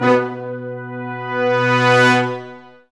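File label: Sections, Brass Large Ensemble sfz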